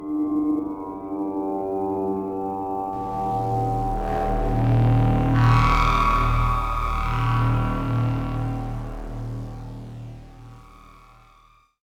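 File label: Day Ambience Stinger; dying light gamerip 2015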